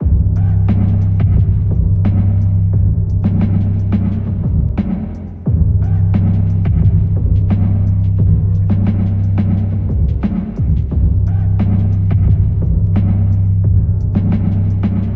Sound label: Music Spill; TheWorkRoom Audio Post